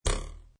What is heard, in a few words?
Cartoon Ruler Twang, Short, basic arrow impact or vibrati; Comedy/Cartoon; Short, basic arrow impact or vibrating wood; Funny Sounds; Lynne Music